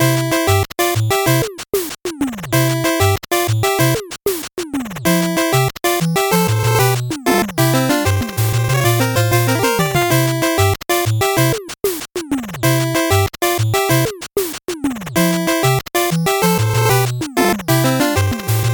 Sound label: 8bit bgm slow